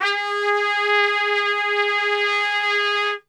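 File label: G#2 piccolo trumpet, Brass; Best Service; Brass Super Section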